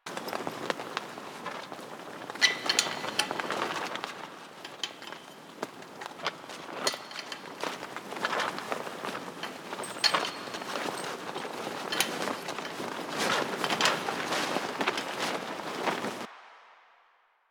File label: Tarp Rustle Loop; Ambience; Props; Warframe; Nineteen Ninety Nine